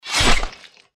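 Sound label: deathclaw claw attack; npc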